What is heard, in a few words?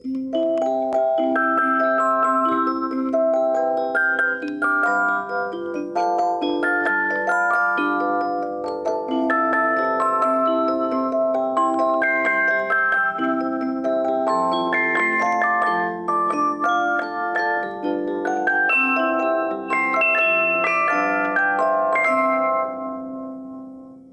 Ice Cream Music MusicBox; The Beautiful Blue Danube